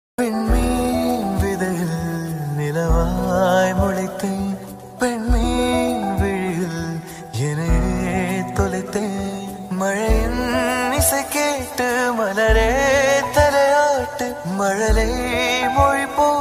tamil love status

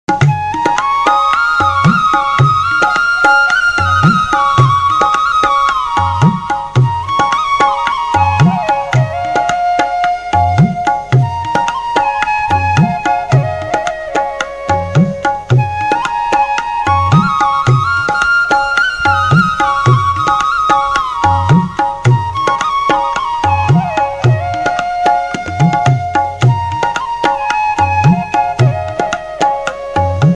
flute ringtone romantic ringtone bansuri ringtone; best flute ringtones

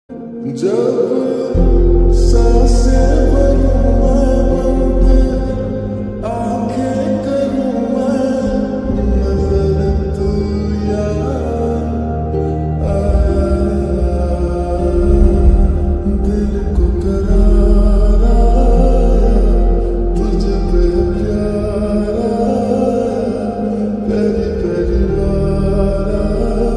slowed reverb song lofi; kiara advani; varun dhavan

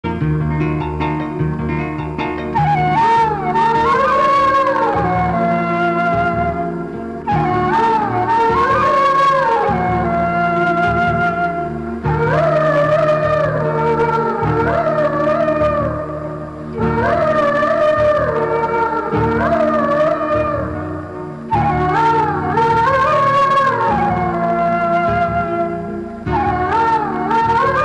melody bgm

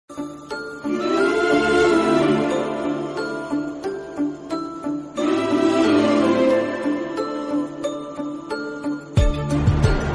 heart touching love bgm; srimanthudu

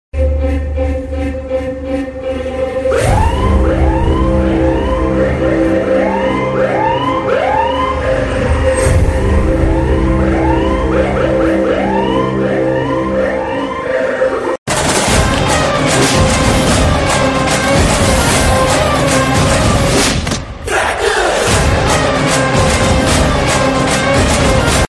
movie ringtone; krack

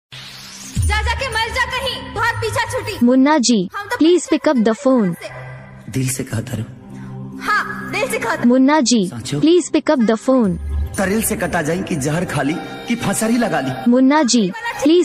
ringtone sad song bhojpuri

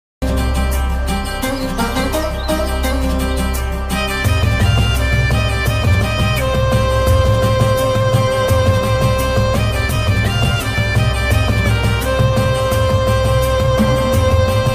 bass boosted bgm ringtone

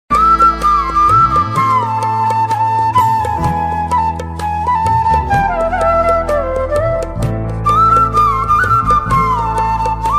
bgm ringtone tamil bgm ringtone; bombay movie

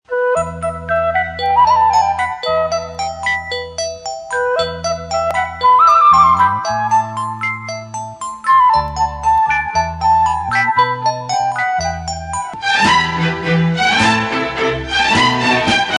kannada ringtone; premaloka; ravindran

